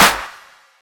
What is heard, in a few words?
crunchy snare sound D key, snare one shot tuned to the D note; snare drum samples